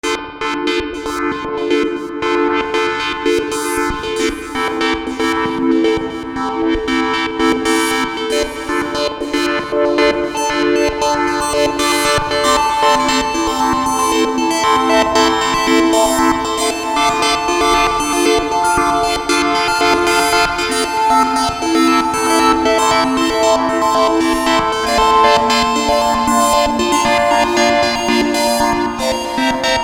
Brutzel Kristall Pad

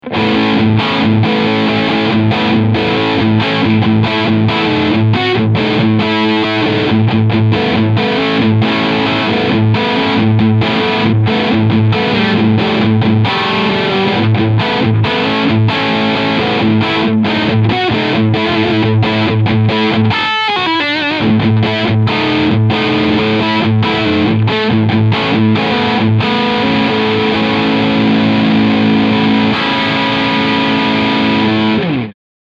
Cort G 290 LE Riff Steg Humbucker

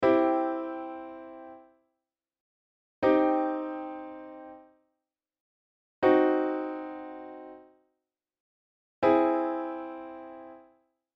Verschiedene Dreiklänge

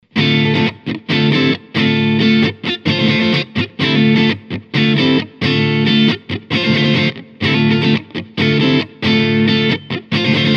EQ Mitte Strat Hals PU Crunch Yamaha

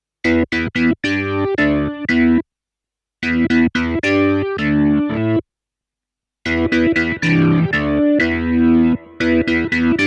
Strymon Big Sky Tube Spring Orgel

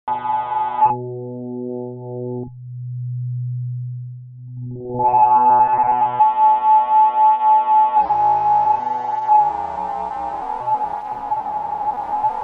FM mit LFO PW Mod fuer OSC1

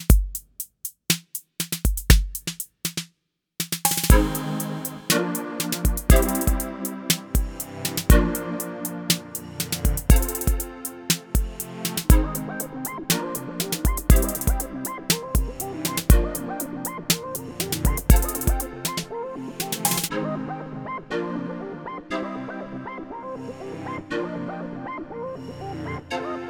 Zoom LiveTrak L 8 Beispiel Musik

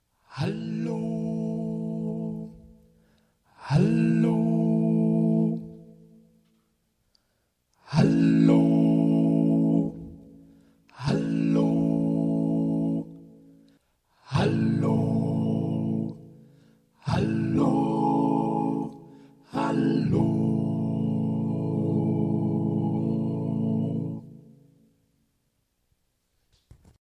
Harmony Effekt mit Stimme

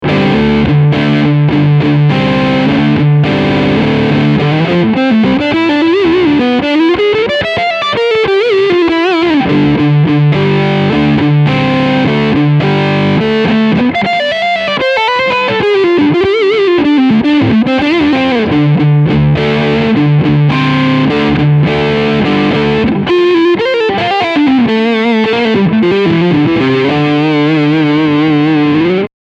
Riff beide PUs

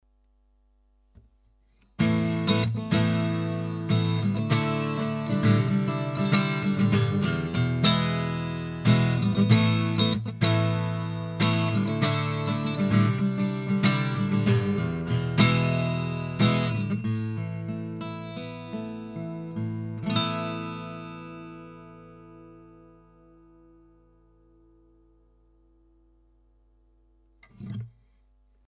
Akustik Gitarre; sE DM1; MD421HN

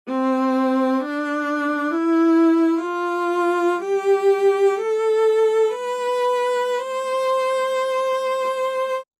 Emotional Viola Sustain trocken

